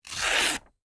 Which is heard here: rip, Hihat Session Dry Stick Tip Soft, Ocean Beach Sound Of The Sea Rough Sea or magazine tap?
rip